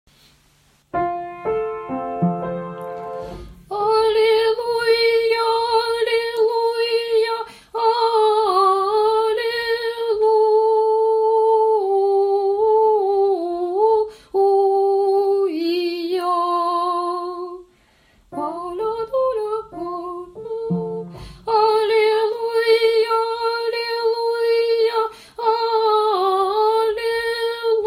Alleluia Ton2 Soprano; Voix soprano

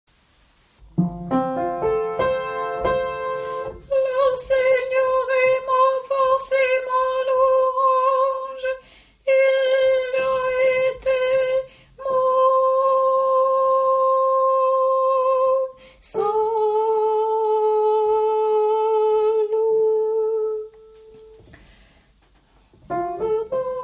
prokimenon tenor; Voix ténor

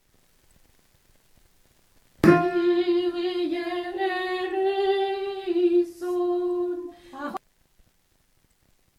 Kyrie Alto

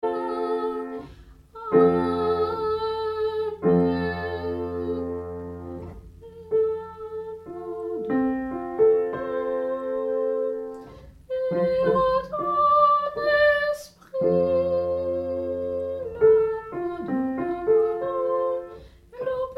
Tenor; Et avec ton esprit

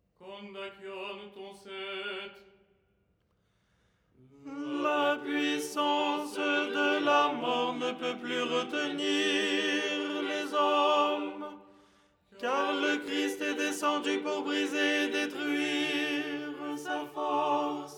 ton7 tenor

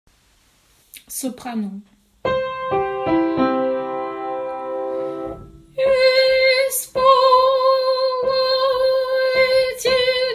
Soprano; Ispolaeta Despota final

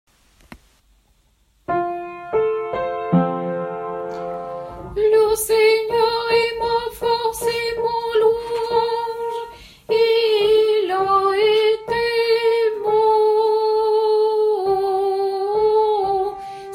Prokimenon Soprano; Prokimenon dimanche; Voix soprano

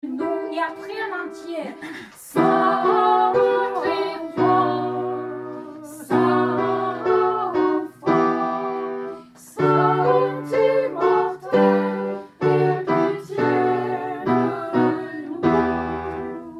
alto; Saint Dieu melod

